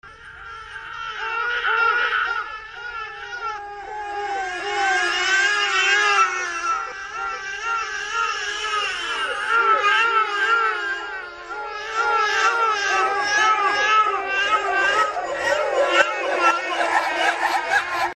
creep babygeschrei

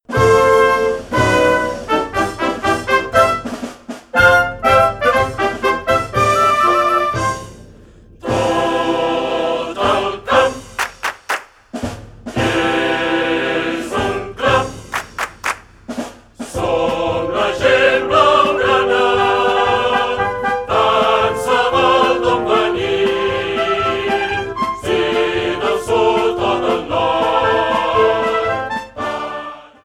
Hymne Fangesang; fc barcelona; barca

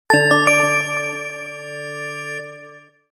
ipod sms toene iphone style

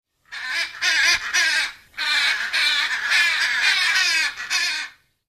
tierstimmen als sms toene hyazinthara papagei

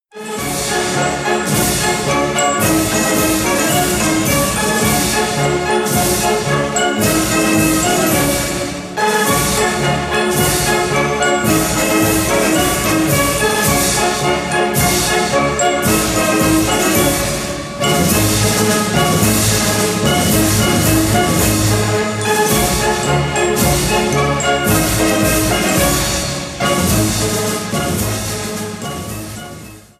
preussens gloria militaermarsch klingelton

